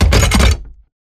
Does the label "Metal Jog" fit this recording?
Yes